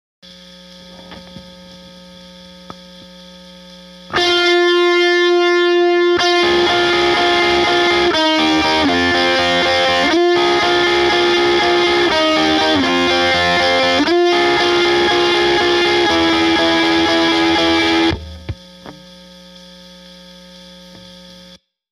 Chorus leads

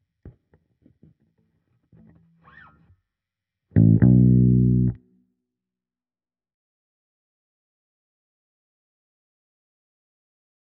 Bass Clean

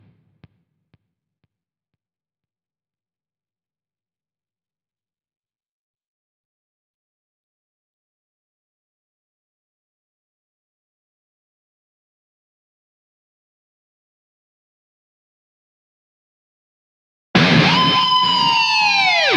Intro Dive Bomb Right, guitar

